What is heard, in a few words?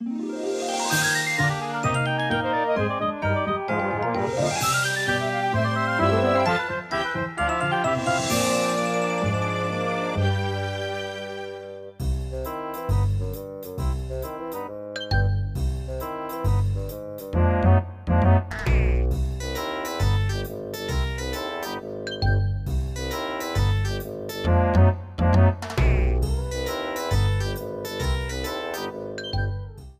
Cutscene Music; DL